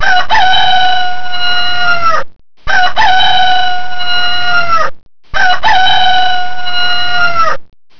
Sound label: rooster